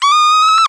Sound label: peewit